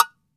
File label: AGO SQUEEZE; AKAI S-Series CD-ROM Sound Library VOL-5